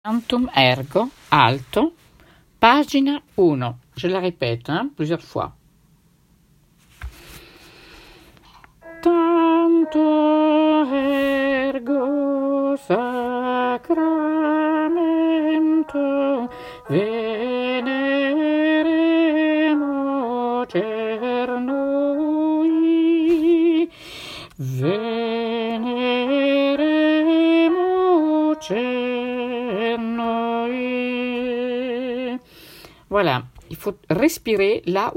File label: alto; tantum ergo; Tantum Ergo de Gabriel Fauré